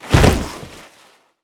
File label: hostage drop; Counter-Strike Global Offensive